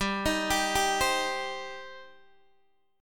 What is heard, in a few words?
G Suspended 4th Sharp 5th, Guitar, DADGAD